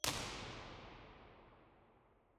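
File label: impulse response, nuclear reactor hall; surround 5.1; williams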